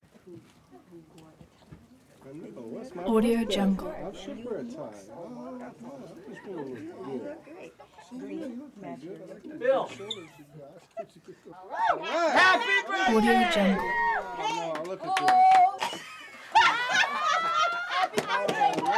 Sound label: crowd reaction small expressive group interior